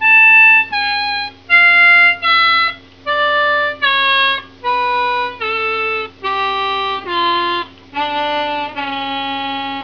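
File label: slo ben down, harmonica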